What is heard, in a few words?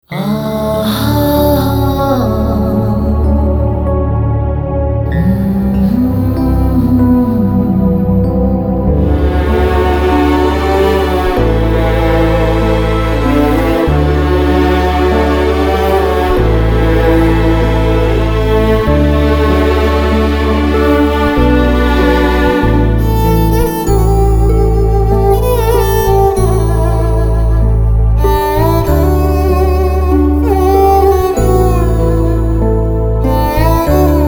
Instrumental